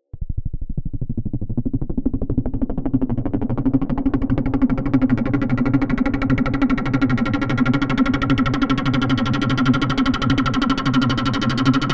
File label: Mini Brute